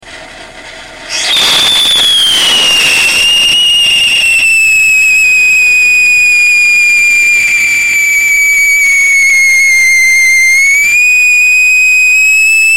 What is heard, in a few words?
plain with core, rockets whistle tests; No Catalyst & No Mineral Oil; Sodium Salicylate